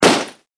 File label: garand